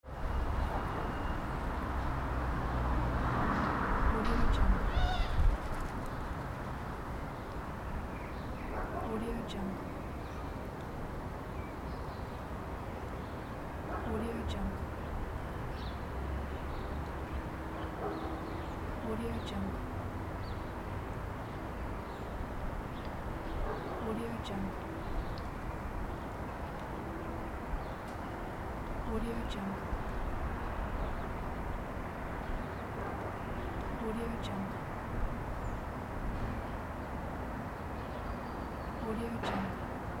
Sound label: outdoor ambience; just max